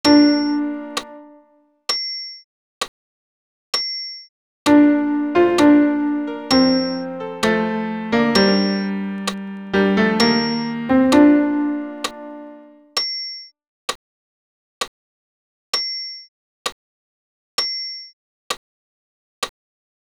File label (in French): Gloria ten pian acc